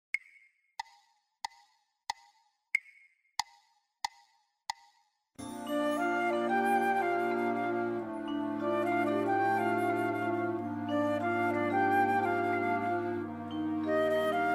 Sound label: 092bpm; Selections from Encanto